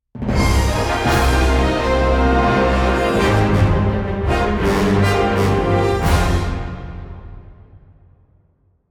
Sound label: Transition